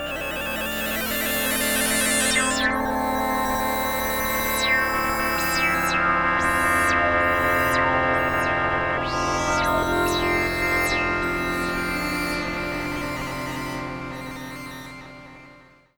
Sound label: F drone